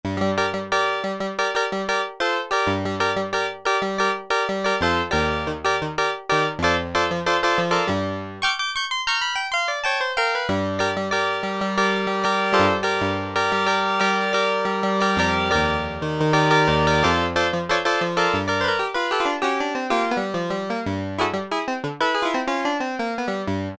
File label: RUBBER CLAVINET, Oberheim OB-8; Factory Patches